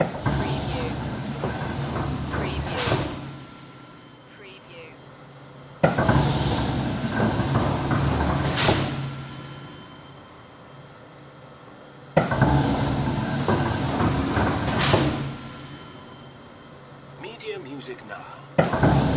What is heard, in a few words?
Stamping Press Operate